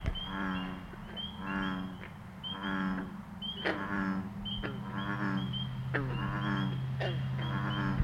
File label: bullfrog